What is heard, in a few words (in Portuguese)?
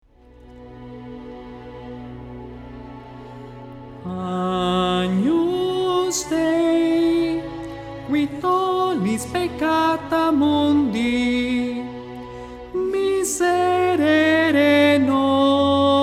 contralto; Agnus Dei